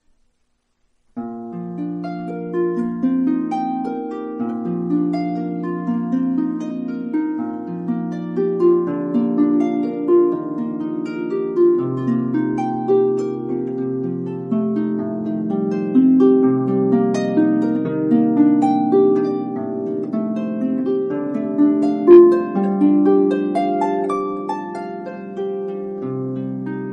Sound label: Harp